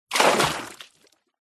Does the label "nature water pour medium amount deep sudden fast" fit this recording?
Yes